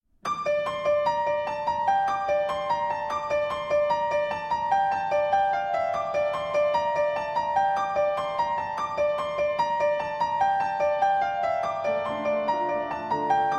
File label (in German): Schlaflied